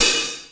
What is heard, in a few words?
open high hat g sharp key; Duration 0:01; Channels Mono; open hat samples